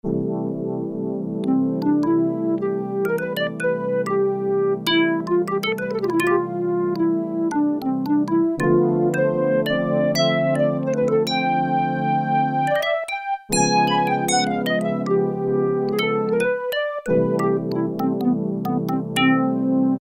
MELLOW SPLIT, Casio VZ-10M; Internal factory combi

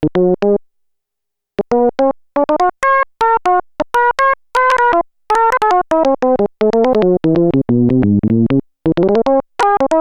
JAZZ ORGAN I, Roland SH-101; Factory Patches

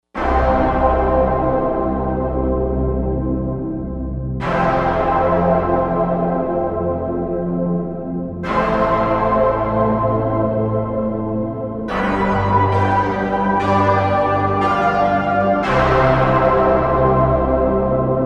Mild Rough, synth

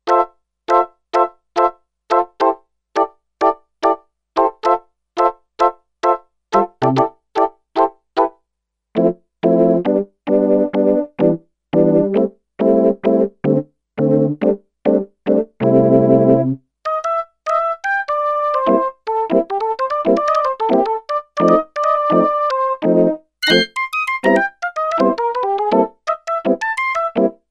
Percussive Organ 3, Sequential Circuits Six-Trak; Factory Programs

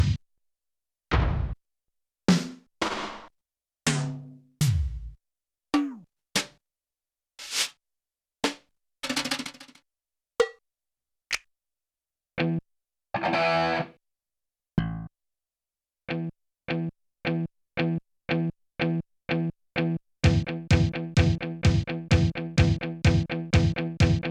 DRUM SET, Yamaha TX16W; Drum Kit; Drum Kit 2

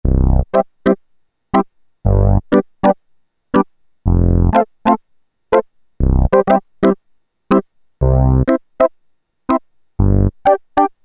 Reggae Sound, Korg PolySix; Factory Presets Bank A

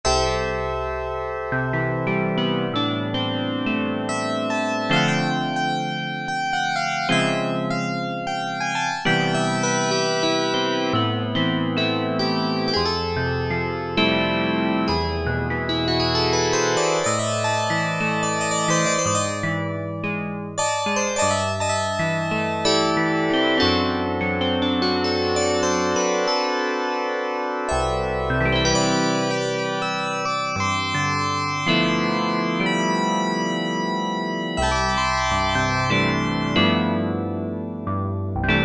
DIGITAL PIANO, Oberheim OB-8; Factory Patches

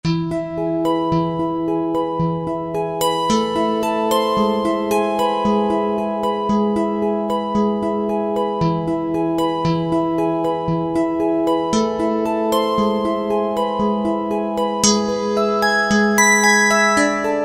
Pot Covers, Korg TR-Rack

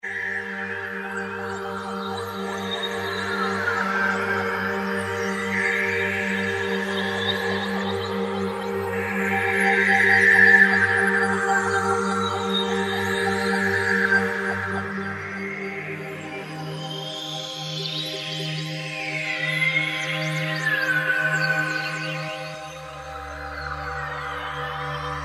Roland JD-990; Factory Patches; Swimotion

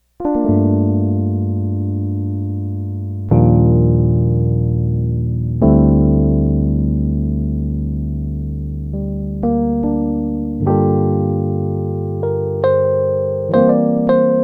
ELECTRIC PIANO

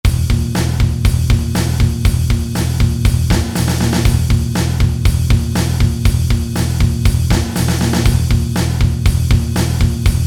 Thrash

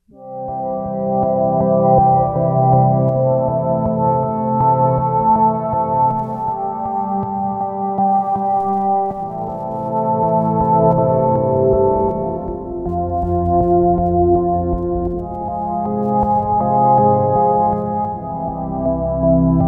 Nice Pad; Novation XioSynth 25

